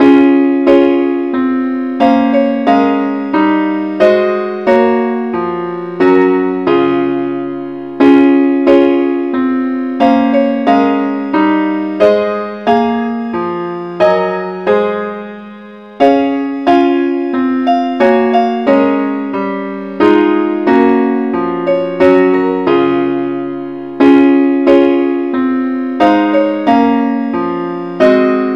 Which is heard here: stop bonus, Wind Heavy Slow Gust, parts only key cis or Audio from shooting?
parts only key cis